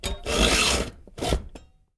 steel, sawing; 1000fx

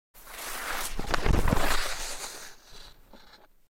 Ski in & Fall on Snow Close Perspective; Sports

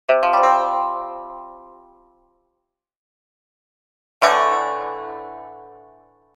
Short ethnic plucked strings; Music effect; Hollywood Edge Sound Designers Tool Kit

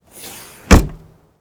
HATCH CLOSE, Cars; 1000fx

